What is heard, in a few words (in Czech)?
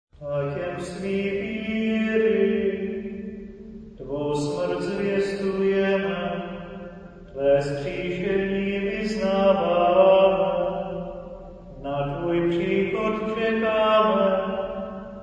tajemstvi viry choral